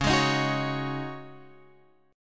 Db Suspended 2nd Suspended 4th Db Guitar Open F; strummed; JGuitar

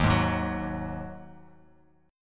Eb Minor Double Flat 5th Eb Bass Standard; JGuitar

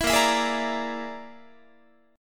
A sharp 7th Flat 5th, Guitar chord; strummed; chordshape; 19,18,19,18,18,21

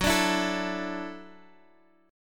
Gb Minor 7th Sharp 5th Gb, guitar

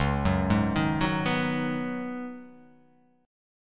Db 9th Suspended 4th, Db9sus4 chord; arpeggiated; JGuitar

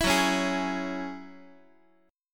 F sharp 7th Suspended 2nd F sharp down Guitar; F#7sus2 strummed

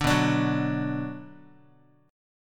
B-Minor, Guitar; strummed; JGuitar

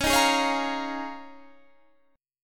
Eb 9th Suspended 4th

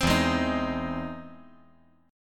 E Augmented Major 7th E down

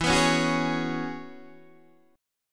E 6th Add 9th E down Guitar DADGAD

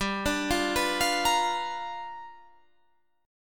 G 7th Sharp 9th G, Guitar Half Step Down

